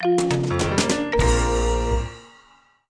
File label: Win Sting